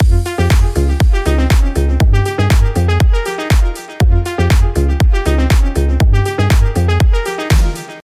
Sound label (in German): Dance ungemastert